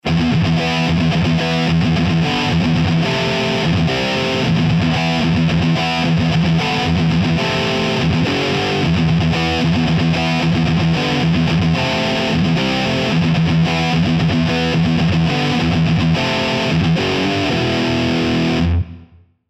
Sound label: metal duel